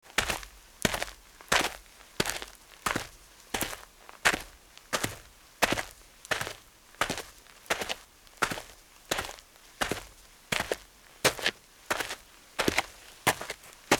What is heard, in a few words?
Footsteps Sneaker Dirt Walk